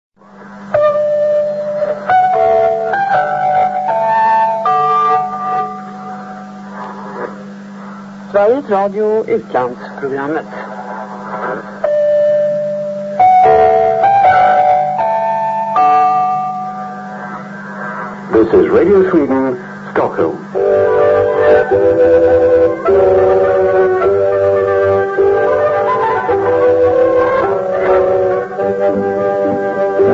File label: Radio Sweden